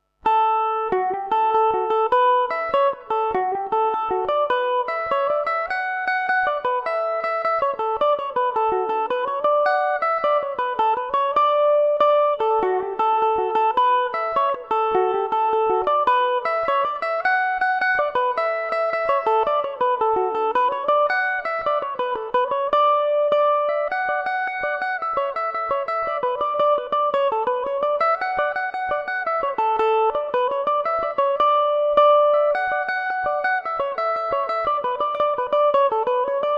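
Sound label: D major revelation, irish mandolin; Planxty Jams O'Donnell; Revelation Jaguar electric mandolin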